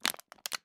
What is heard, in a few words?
glass crack